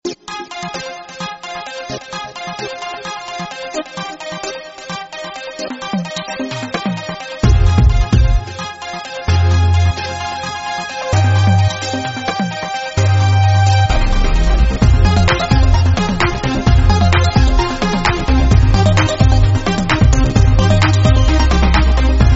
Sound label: Electro